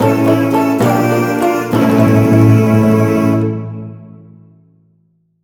Pvp Jingle Lose

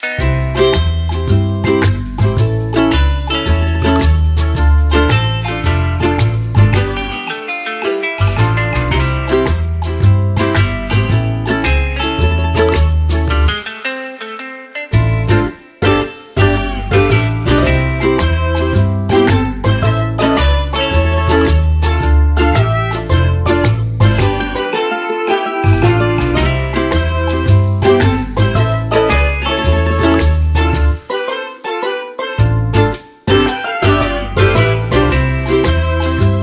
BGM Tropical Soda Islands

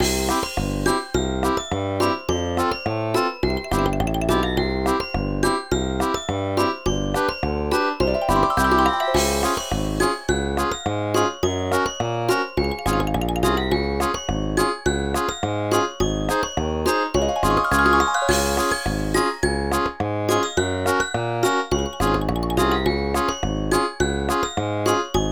Bgm circus event attraction

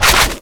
Crash Obstacle; Werewolf

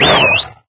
transform start; Cookie Run; Jungle Warrior

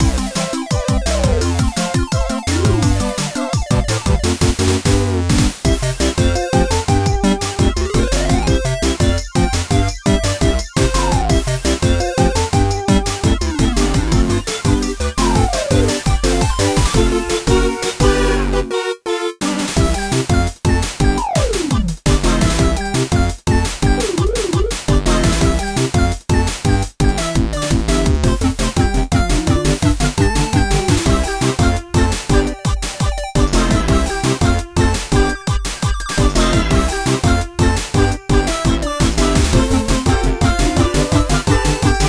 Bgm trial firework

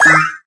slide; Pastel meringue cookie; cookie run

